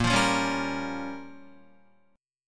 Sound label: A sharp Minor 6th, Guitar; strummed; 5,8,5,6,7,6; 4F,4A,5C,5G,5C,6E; JGuitar